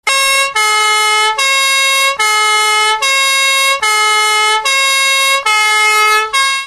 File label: Siren